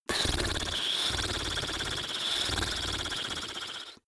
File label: blow lips; koerpergeraeusche; menschen; soundpool